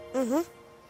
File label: mhhh